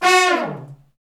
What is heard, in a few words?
Fat Section Falls, Brass Sections; Roland LCDP06